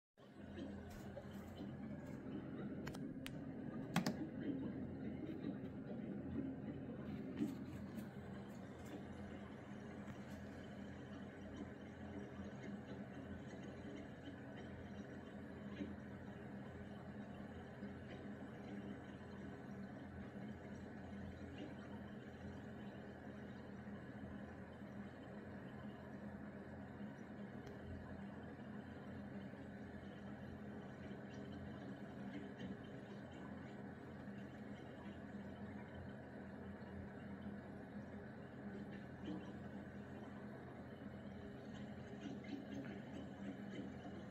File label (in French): bruits de gargouillis clim mitsubishi